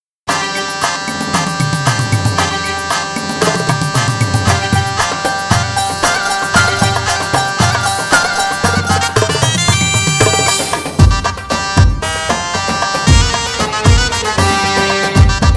Sultana Saidi, bellydance music

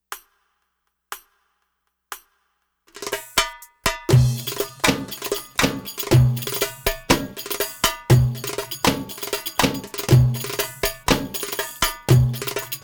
Wahda Kabir Fast, bellydance music

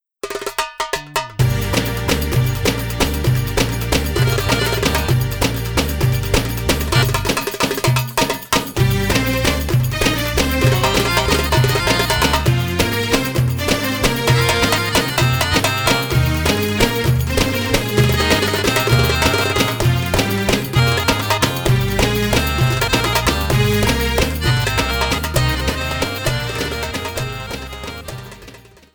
Malfuf Music, bellydance music

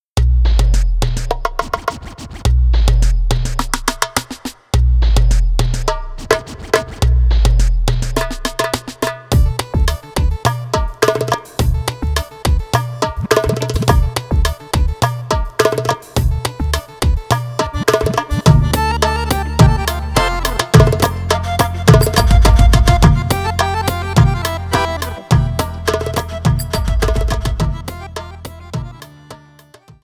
Baladi, bellydance music

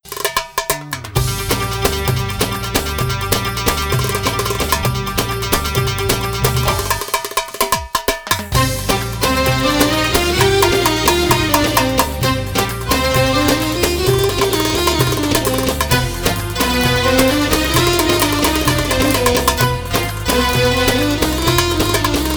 Malfuf Signature Tune Intro, bellydance music

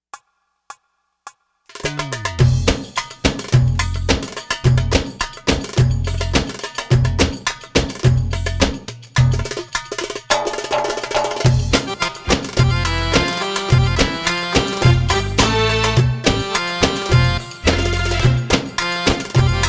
Maksum Music, bellydance music

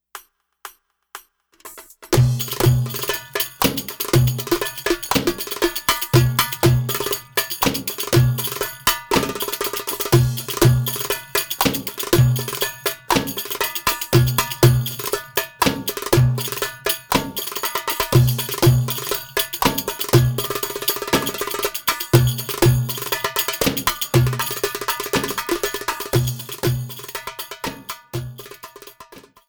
Masmoudi Fast, bellydance music

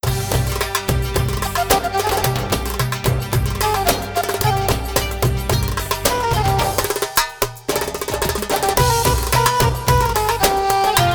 Saidi, bellydance music

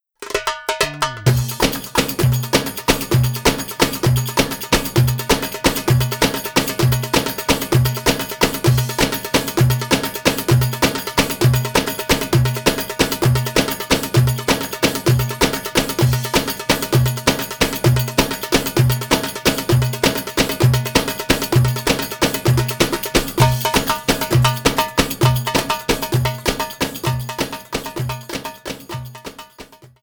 Malfuf Fast, bellydance music

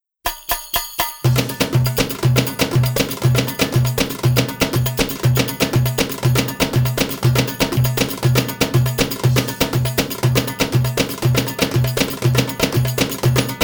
Felahi Fast, bellydance music

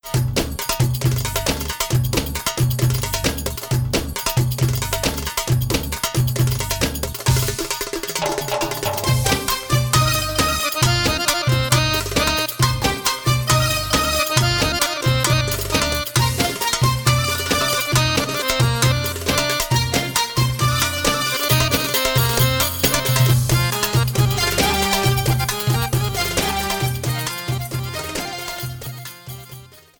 Maksum Signatur Tune, bellydance music

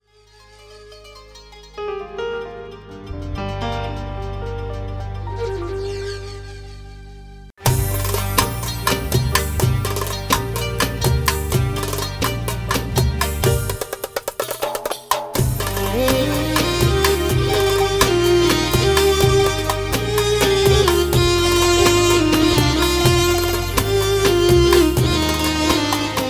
Latino Oriental, bellydance music

